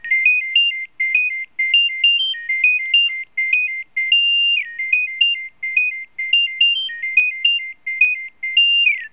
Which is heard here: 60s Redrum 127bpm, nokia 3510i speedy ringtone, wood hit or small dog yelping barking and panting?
nokia 3510i speedy ringtone